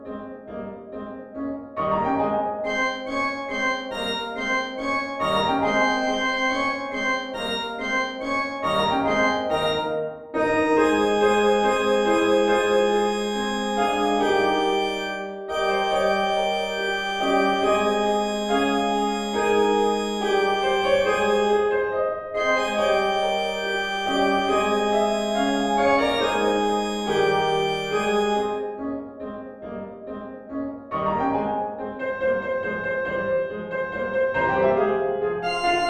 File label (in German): Klavier Sopran; Zauberfloete; zu Hilfe sonst bin ich verloren